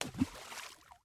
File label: Hotsprings Footsteps Land; Main Character